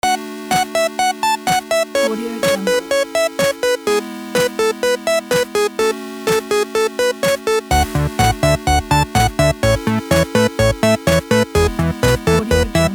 8bit fun story looped

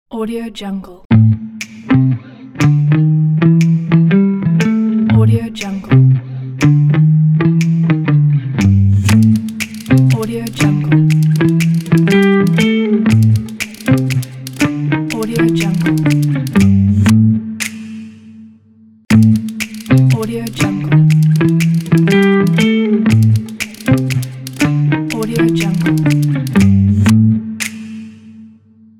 guitar bass snaps logo

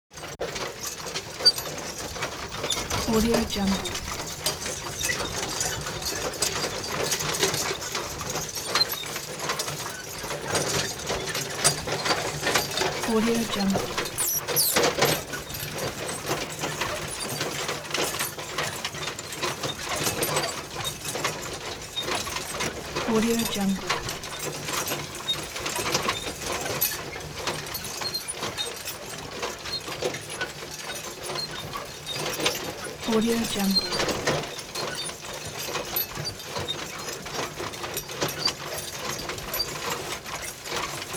horse drawn implement drivers perspective

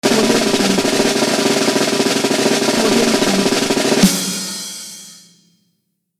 drum roll

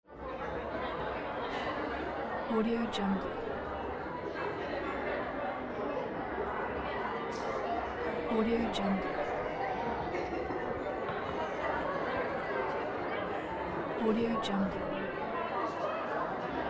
bar noise

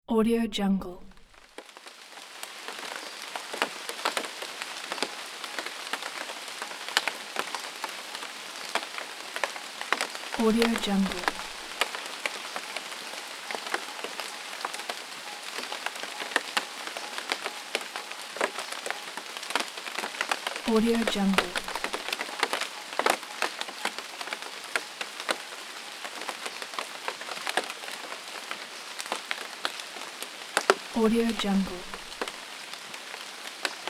gentle rain

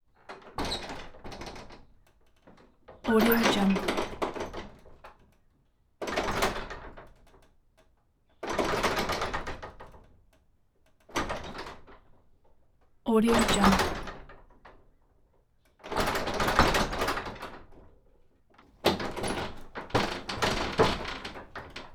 barn door wood

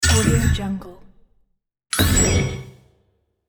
ancient game coin pick up

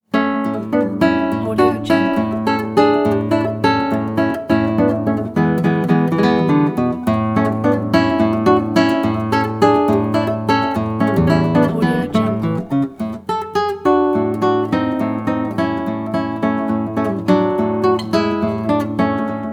classical guitar celebration

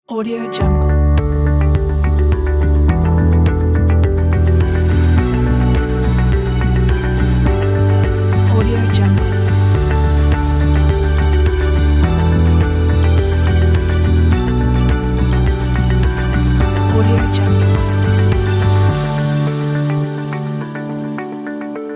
ambient minimal ident

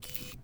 camera point shoot

